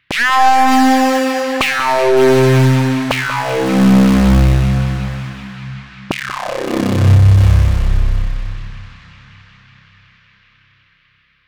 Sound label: Psychedelic, synth; Сэмпл синтезатора (Ambient Synth); 80BPM; ambient vol. 3